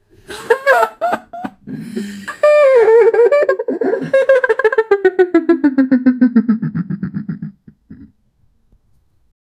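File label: the sound of jazz instruments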